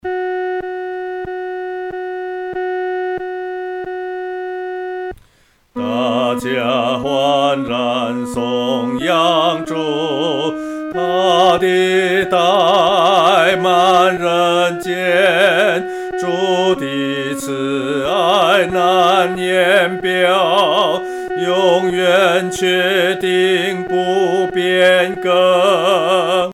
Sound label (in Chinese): 独唱（第二声）; 欢然颂主